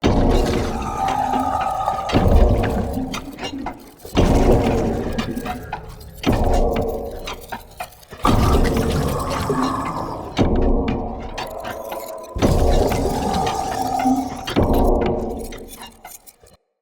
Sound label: Ruins Ode Machine Loop; Props